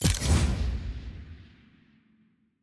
button map click; parties; gameselect

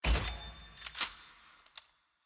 sfx ui collection artifact click; caitlyn

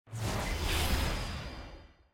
ui map click; sett

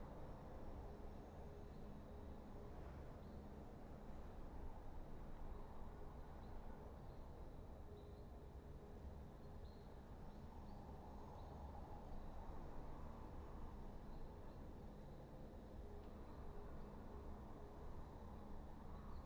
ambience loop; classic sru; summonersrift

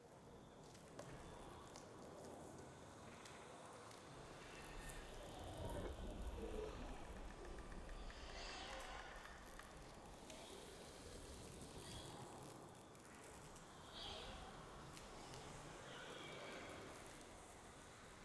amb forest; ionia

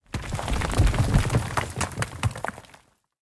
unearth bricks

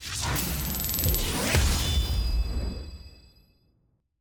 levelup postgame generic

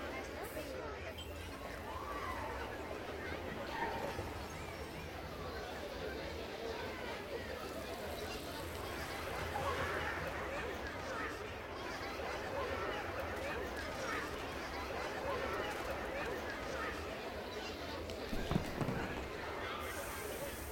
amb market ground; tft lny2022

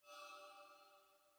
sfx ui hover; arcane

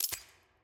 sfx vc team ptt release; premade voice

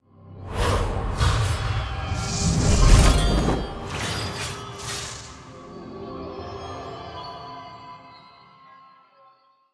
intro sound splash; kayle morg

